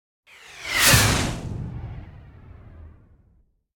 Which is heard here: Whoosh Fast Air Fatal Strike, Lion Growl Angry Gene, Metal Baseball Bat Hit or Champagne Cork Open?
Whoosh Fast Air Fatal Strike